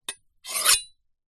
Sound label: kitchen knife large scrape on metal; household